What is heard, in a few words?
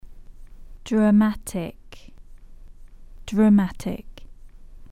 dramatic